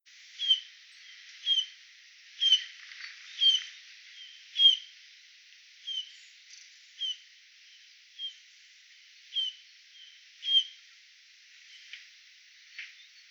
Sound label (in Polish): gil zwyczajny Pyrrhula pyrrhula